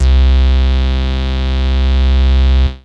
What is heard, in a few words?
Big Synth Basses